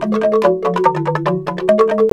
Bamboo A5; Tonkotsu; CMI

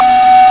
ZAMPNA; CMI

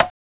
L CON SLAP; CMI

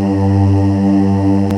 Choir; CMI

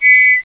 WISL; CMI

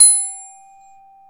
Glock; CMI

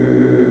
VOX; CMI

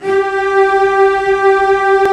Cellos; CMI

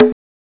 CONGA; CMI

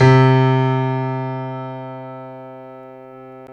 Piano Grand; CMI

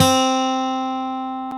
Gtr Acc; CMI

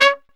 Brass Stab; CMI

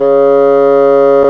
Bassoon; CMI

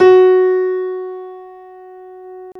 Piano Grand; CMI

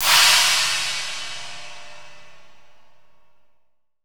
Kit Heavy; CMI

